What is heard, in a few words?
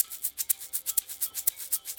egg bell